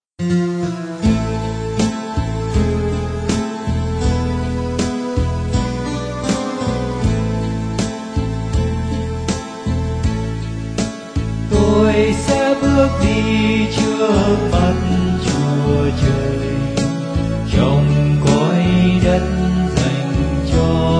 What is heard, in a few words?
bass